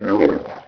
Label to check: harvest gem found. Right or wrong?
Wrong